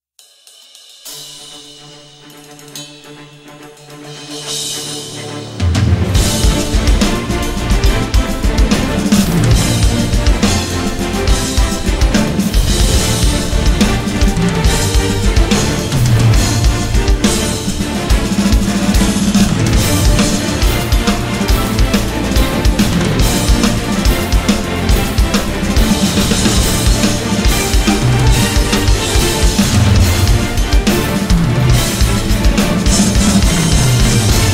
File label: epic drums